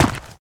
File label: gravel, dig; HunterZ G4; minecraft